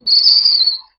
suono di una, currency

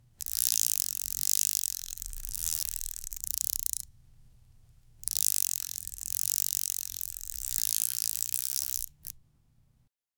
una corda che ruota, string instrument

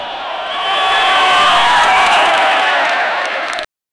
suono del stadio, stadium

